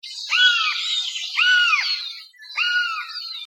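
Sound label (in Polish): sójka naśladownictwo głosu myszołowa; ptaki glosy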